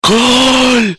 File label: goooooool